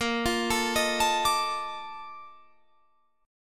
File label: Bb Major 11th Bb down Guitar Standard; arpeggiated; JGuitar